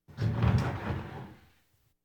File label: Household Phones